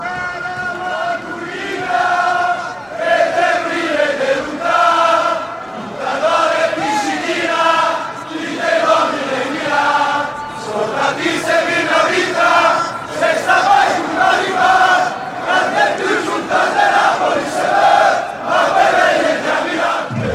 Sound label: chant a milan song